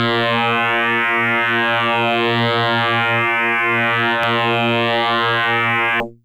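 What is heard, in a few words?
Electric Bass DX100 A#2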